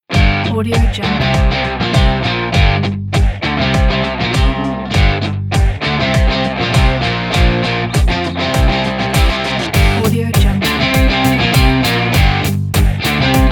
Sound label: sport rock